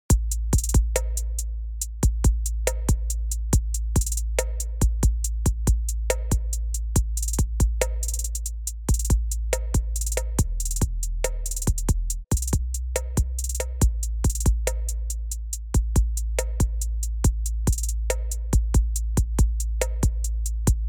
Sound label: chill hip hop breakdown